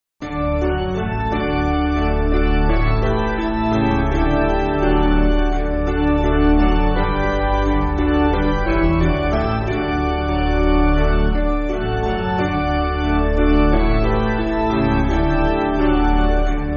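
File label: Piano, Music Backing Files for Churches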